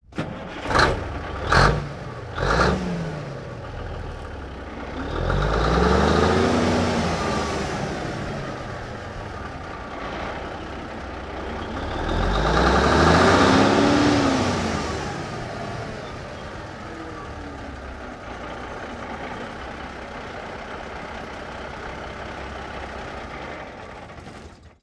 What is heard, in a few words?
1954 Big Rig Int Start Rev Idle Off, Period Vehicles